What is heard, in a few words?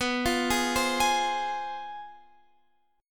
F Minor B; Fm/B arpeggiated; JGuitar